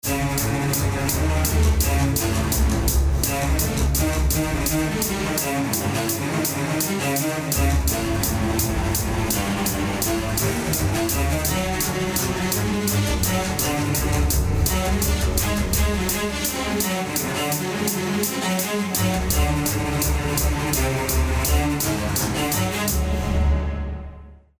Additive rhythms

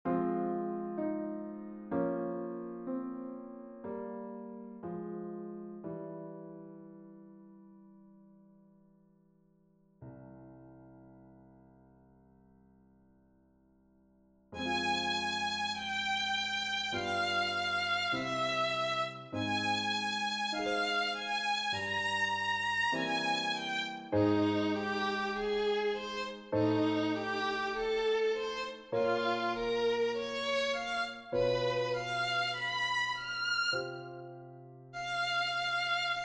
for violin and piano accompaniment; Le Loup et le Lapin (The Wolf and the Rabbit)

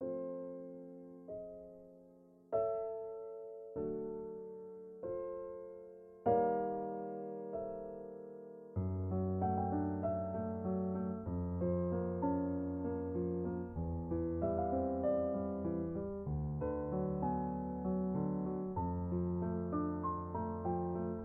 nocturnal

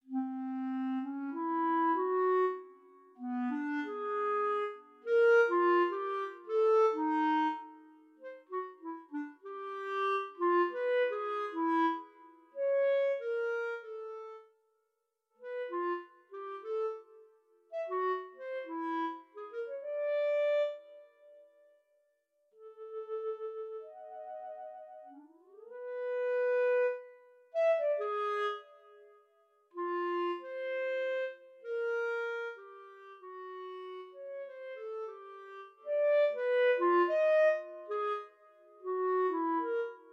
CLARINET

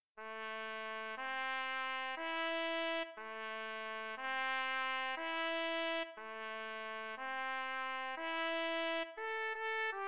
Soliloquy for Trumpet

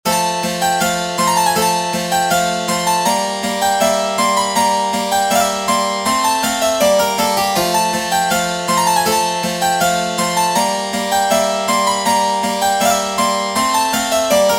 Piano

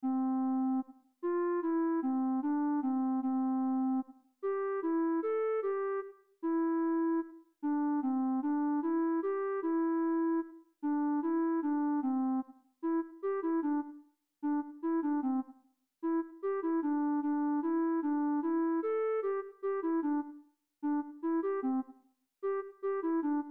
C Major; Excerpt of Movement 1

